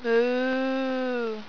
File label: moo; hall of moos